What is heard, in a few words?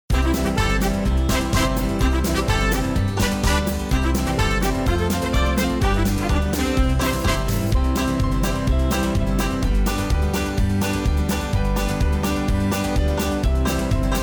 rhythm track, square dance music